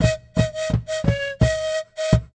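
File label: panpipes toot; Musical Instruments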